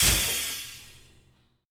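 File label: Aircrafts MiG-29 Canopy Open End Cockpit; DCS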